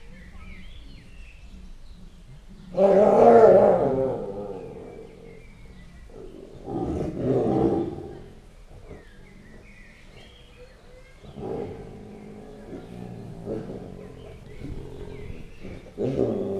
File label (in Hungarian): vehemensebb együttlét, bengáli tigris; gyori zoo 2014